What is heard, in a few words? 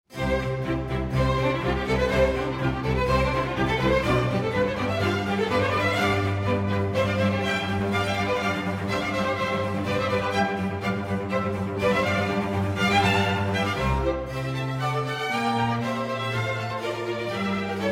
Bassoon Concerto in C Major, Allegro